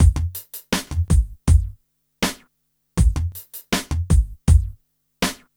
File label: Smooth, R&B Drums